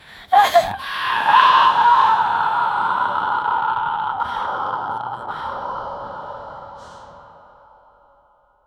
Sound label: low pitched female attack